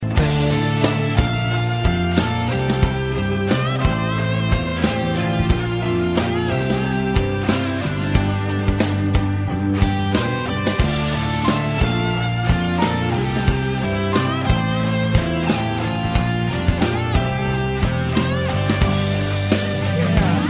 Greatest Guitar Solo

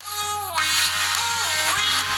Whoo wee

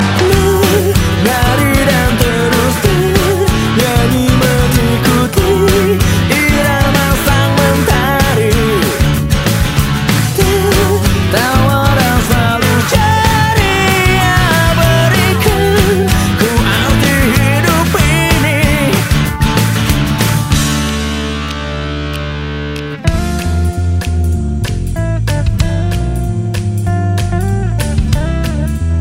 J-rock

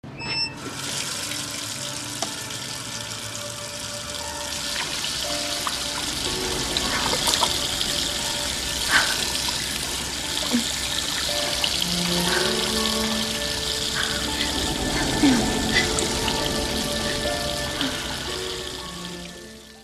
Tap Water

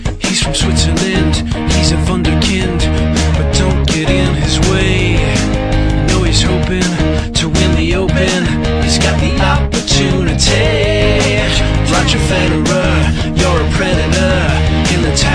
Song

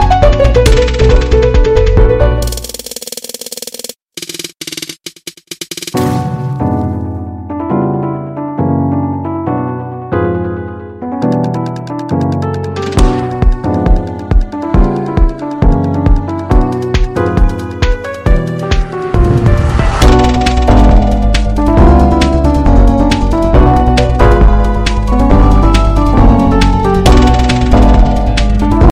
Noteblock

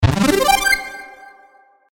Arpeggio

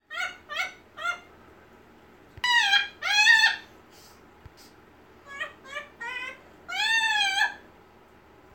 laf

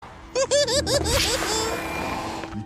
evil laugh; Slappy

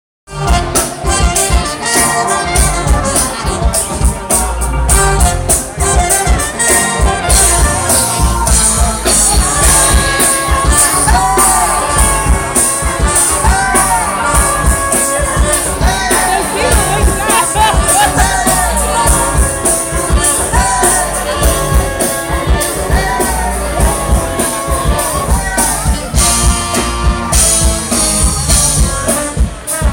Jazz

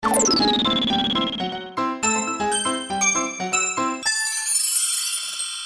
Sms Happy

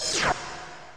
Push Notifi Sound

